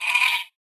Sheep bleat; Linirea High Sorcerer

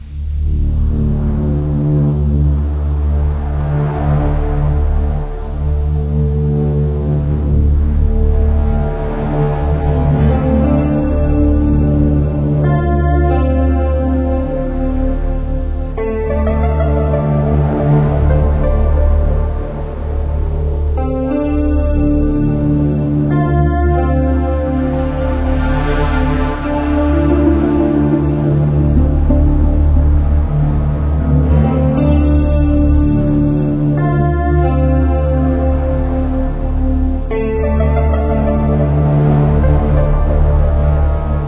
Ambient Loop; Sun Down

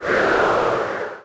Wind wave

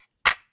Cassette Rattle

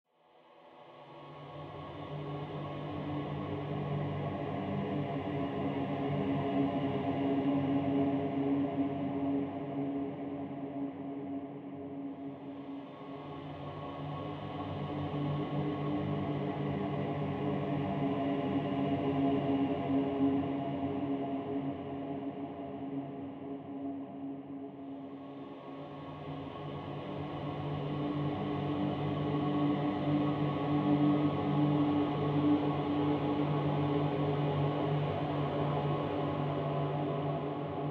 Dark Ambient

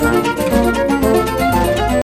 mus cage forcefield; jungle world

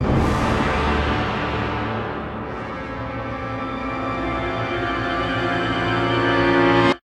Music nice sting; BOE sewers enter; DW6 EP1

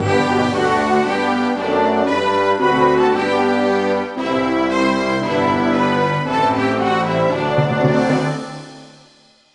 Anthem New Zealand; Buzz! The Mega Quiz; PS2

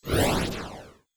Monster Dies; Idle Wizard

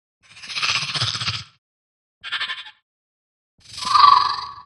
Bat Idle Dizzy

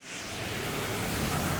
void cloak get fill up

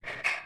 Breathe

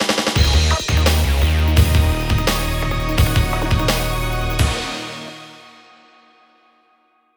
win stinger; mokoko x; Turbo

